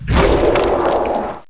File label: die zombdroid Soldier